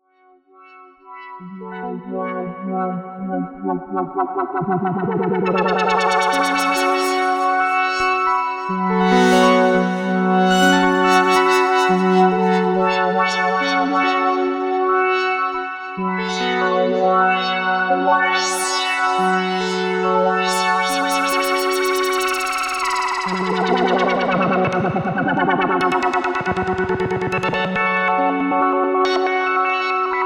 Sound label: Evolving MKS 80 Pad; Driven by GMS